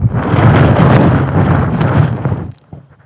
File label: WORM; outcrop